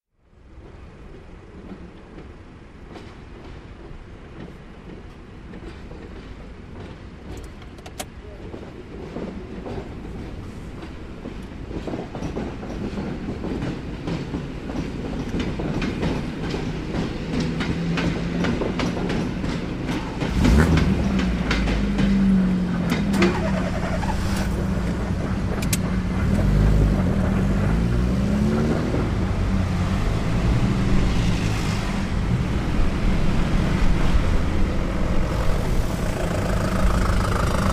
zvuki zheleznodorozhnogo pereezda

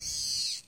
zvuki podzornoi truby

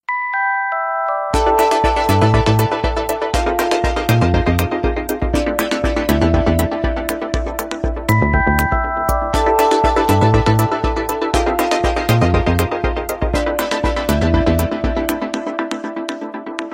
originalnye ringtony Nokia 5310 XpressMusic

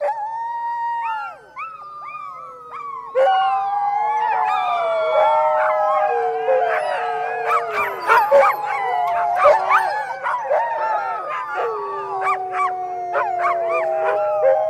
zvuki s voem sobaki

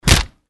zvuki draki liudei i udara kulakom

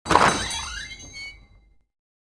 zvuki dikogo zapada

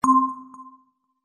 zvuki antivirusa; avast

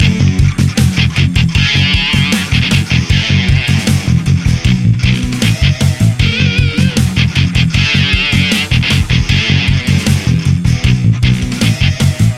zatsiklennye melodii dlia gitary loop

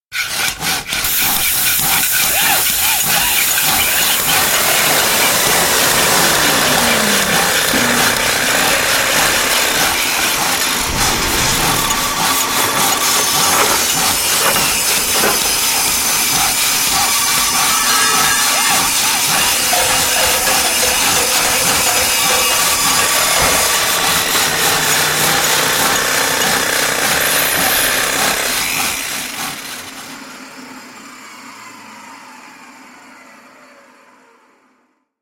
zvuki stroiki i remonta

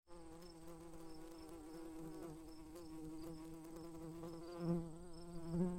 zvuki shmelia

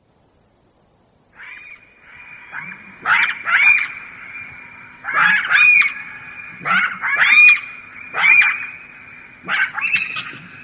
zvuki shakala

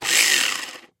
zvuki blendera i sokovyzhimalki

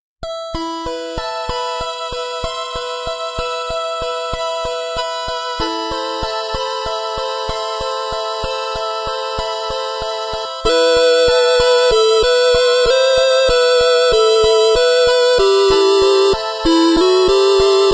8-bit muzyka na sotovyi telefon ringtony

